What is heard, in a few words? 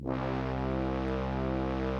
Instrument